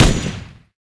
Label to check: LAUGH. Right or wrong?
Wrong